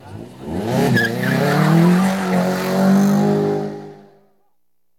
Vehicle Small Car Burnout

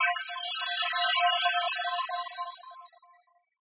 Teleport

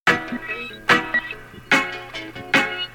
Skanks

